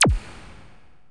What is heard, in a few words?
Korg x5, Drums